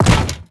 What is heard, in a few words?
metal heavy equip, inventory